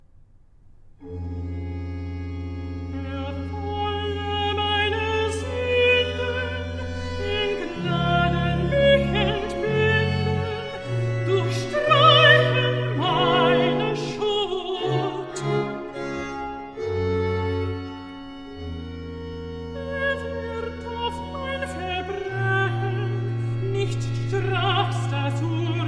Recitativo Cantata; In allen meinen Taten BWV 97; Johann Sebastian Bach

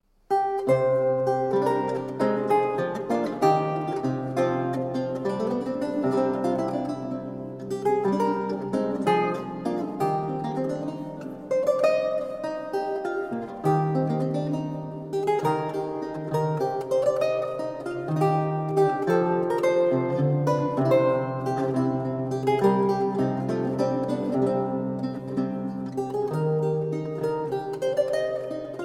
Passion, Lute music; A Coy Joy; John Dowland; Lute songs

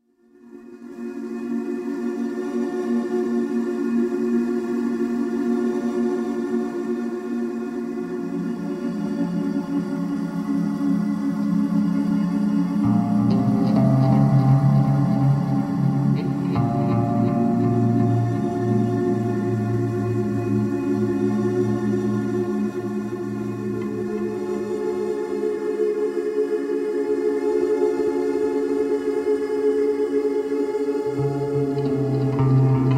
Infinite Waterbells

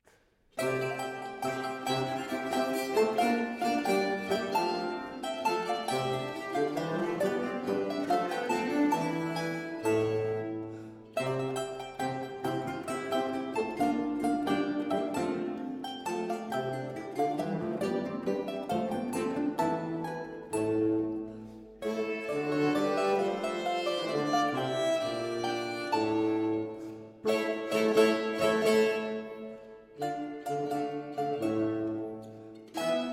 Saltarello detto Trivella; Orazio Vecchi

